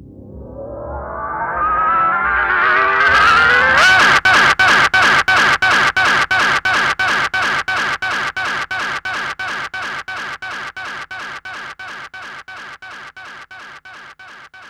rise, fx & sweeps; EZRollers

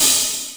SR16 Crash, Alesis Sr16; Drums

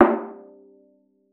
VIOLA FINGER; Xsample VOL-5

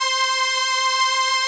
SOLINA, String Machines

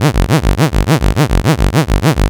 LFO, Noise

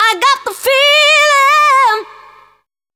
VOC F, Dance Vocals, FEMALE; Best Service ProSamples vol.12; AKAI

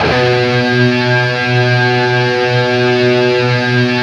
LEAD, Guitar; East West; Steve Stevens Guitar Samples Collection

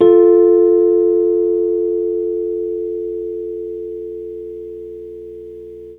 FM 3RD, Chords Hits; E-MU Producer Series Vol. 8 – Platinum Phatt; EP Chords Hits

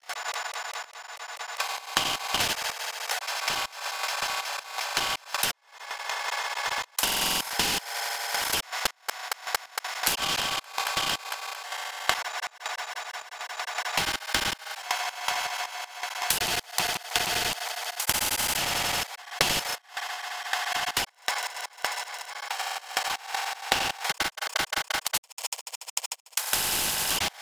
glitch noise

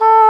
WND ENG HR, English Horn; Roland LCDP04 Orchestral Winds

